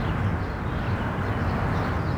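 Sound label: shops ambience background; Tony Hawk's Project 8; XBOX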